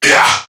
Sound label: Pain Crewmen; Warframe; CorpusCrewmen; CrewmanA